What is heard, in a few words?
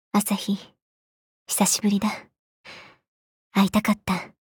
applause